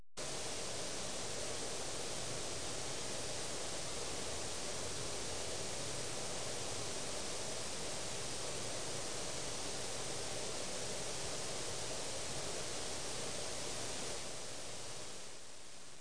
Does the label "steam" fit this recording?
Yes